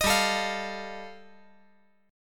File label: G 7th Suspended 2nd Sharp 5th, G, chord